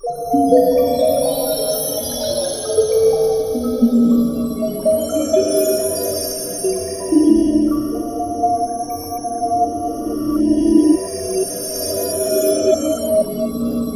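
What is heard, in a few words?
EFFERVESCENT, SCI-FI CHIME; Spectrasonic Distorted Reality 1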